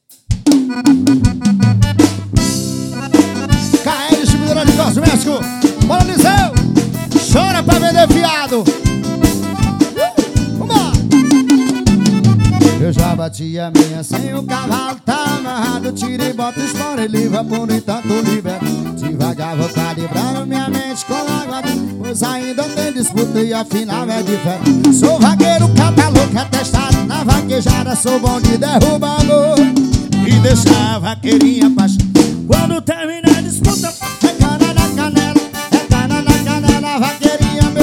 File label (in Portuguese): forro raiz